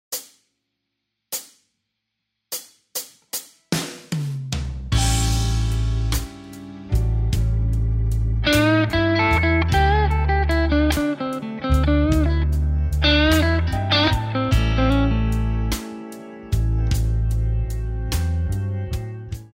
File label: Lick, guitar; Blues Guitar Method; Beginner/Intermediate licks